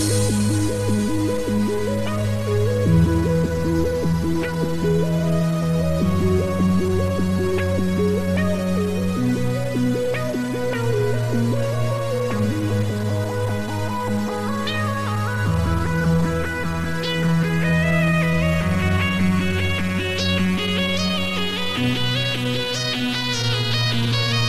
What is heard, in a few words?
Mellow Techno